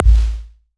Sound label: Kick